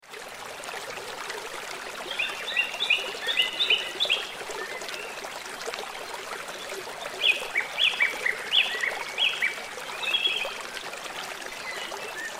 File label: Waterfall river creek bird chirps in foreground